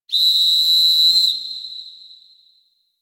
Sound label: Round Start; Ambience; Warframe; Grineer Beach